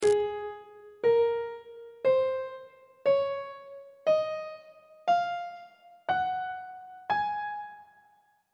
g sharp mixolydian mode note positions on treble clef